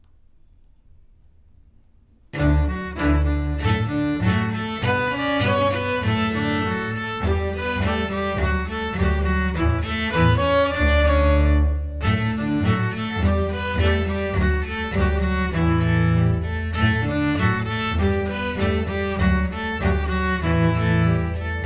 Twinkle Final orch

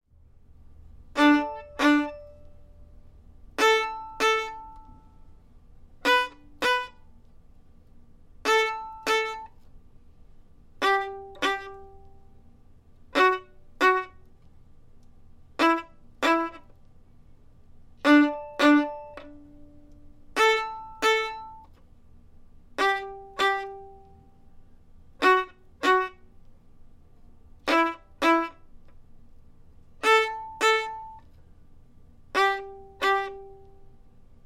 Twinkle Stop Violin

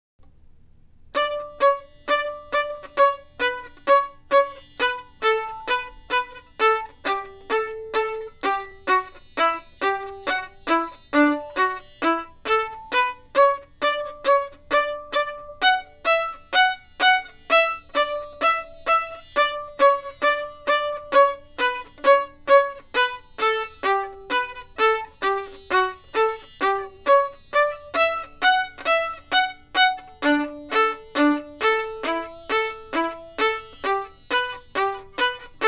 Graduation March Violin

